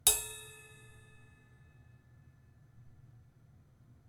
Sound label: Cymbal